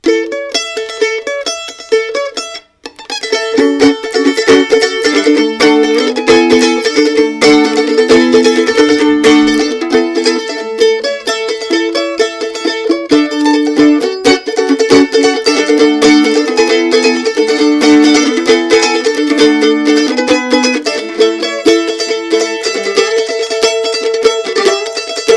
mandolin with improvised hillbilly breakdown

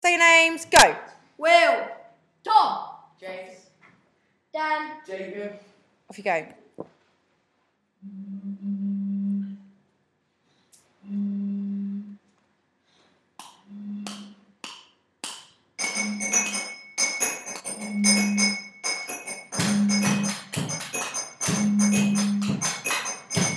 percussion

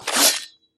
claws; x-men

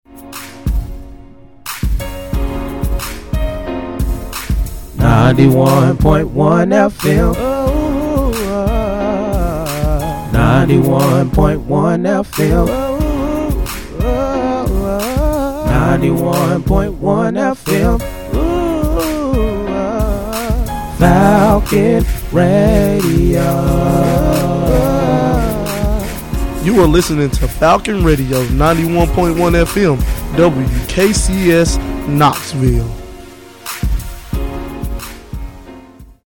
radio jingle; wkcs falcon

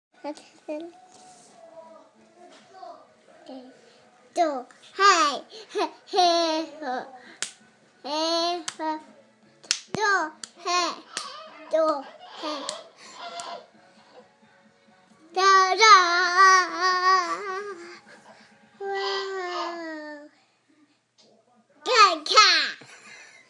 as sung by a 3 year old

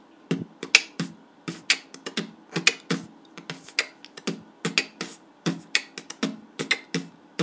beatbox

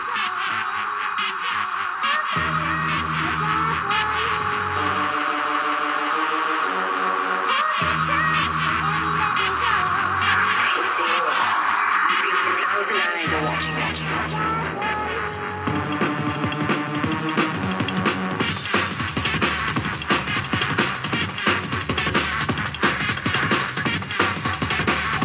drum n bass

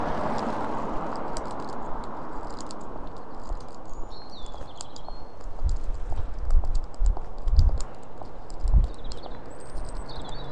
dusk walk home last night with birdsong

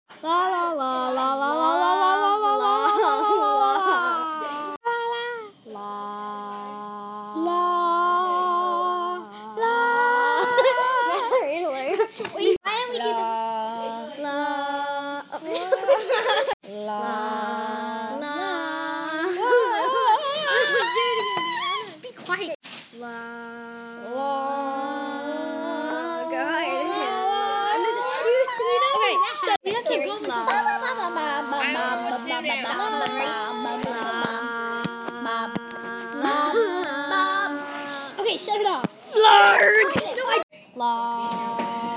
lalala

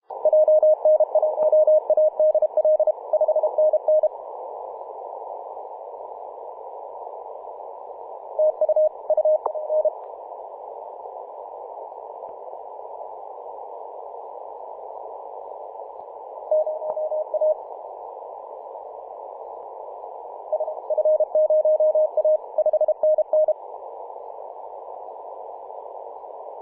24.903 MHz CW; vk9 g7vjr

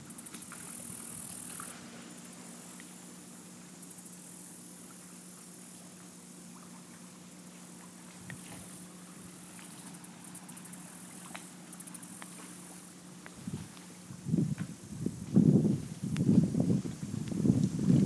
soundscape; starved rock

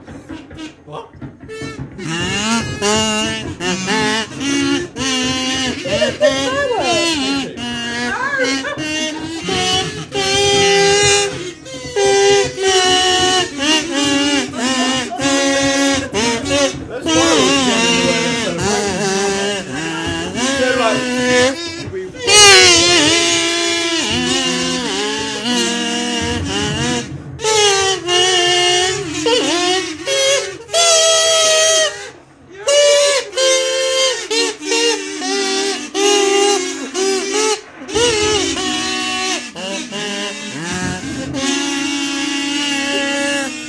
auld lang syne on kazoo

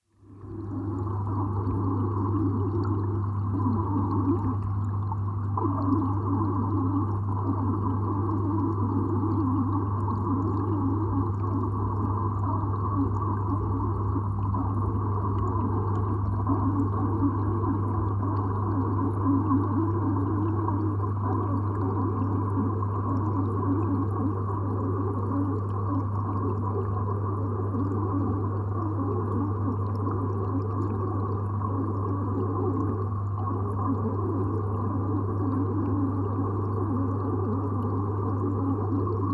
squeaky fishtank

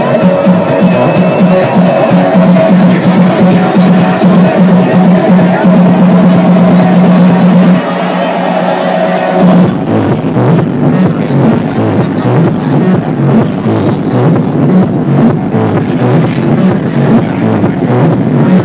distortion

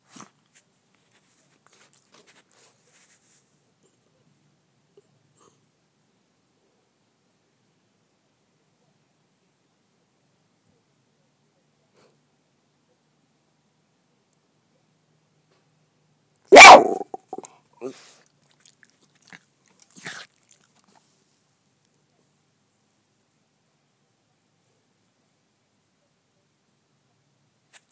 dog snorting and barking at the neighbor

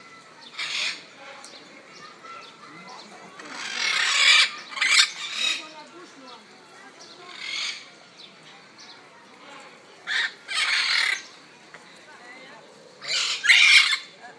noisy birds

